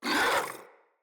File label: Infested Charger Pain